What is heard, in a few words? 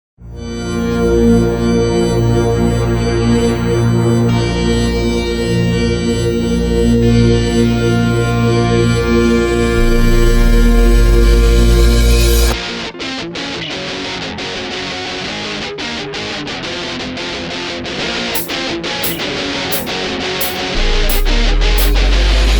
Eurobeat (Instrumental)